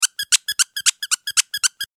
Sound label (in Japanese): マスコット ピコピコピコピコ